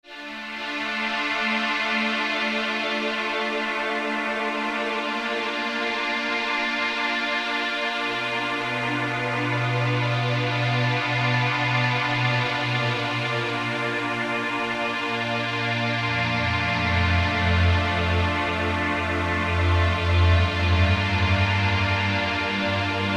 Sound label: STRINGS Reflective Strings; Apple GarageBand 2